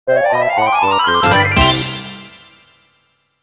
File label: Collect Jinjo; Banjo-Kazooie's Great Adventure Complete Sound Version